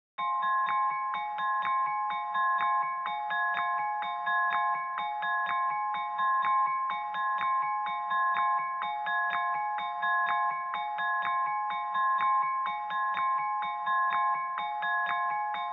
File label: 125 BPM F Min Glockenspiel; Melody; Loop Stems; Cymatics; Sparkle Up; 2022 Melody Collection Platinum Expansion